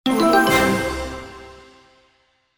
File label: game reward; medusamusiclab